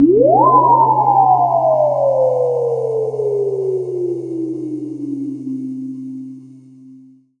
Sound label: BOIIIING, SF EFFECTS; Q Up Arts; Dream Experience